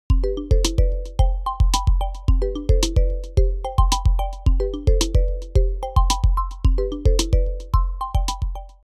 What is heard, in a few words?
Bubble; Samsung Galaxy M31; ringtones